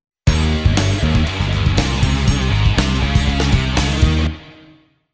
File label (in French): virgule poker